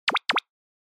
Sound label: Anime Motion Double